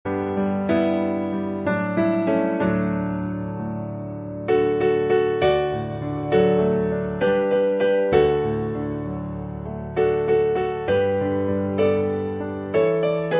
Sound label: instrumental piano